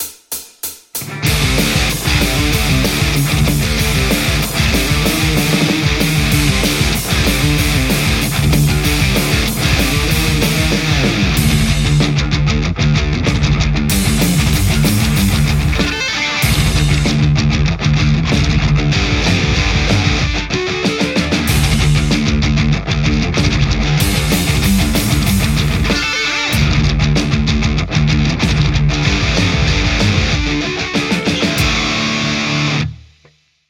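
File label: metal Telecaster JCM800 hot; mercuriall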